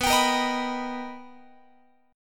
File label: B Augmented Major 7th B Guitar, chord; B+M7; strummed